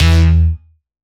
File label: synth one shot serum distorted 80s, E0